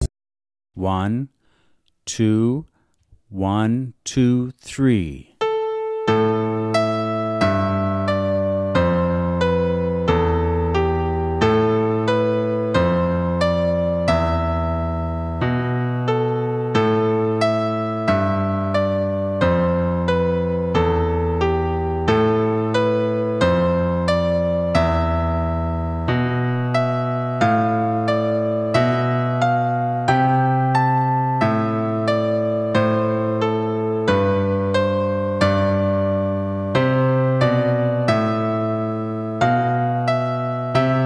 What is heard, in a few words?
Duet, guitar